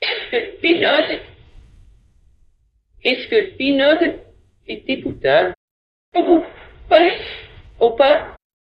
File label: jingle